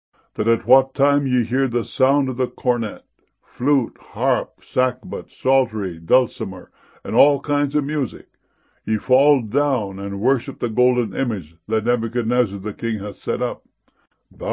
dulcimer

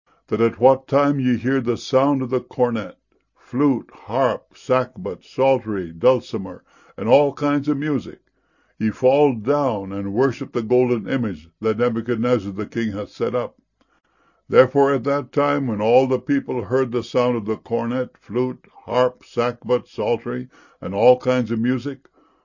flute

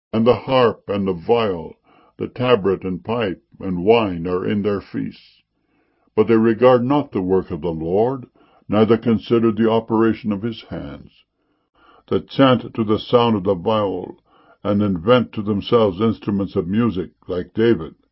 viol

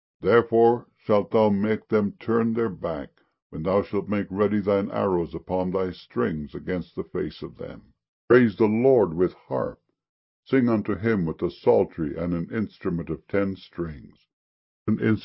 strings

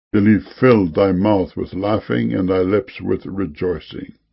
laughing

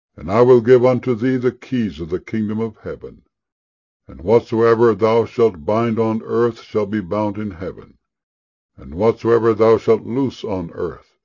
keys

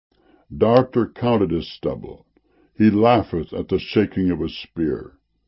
laugheth

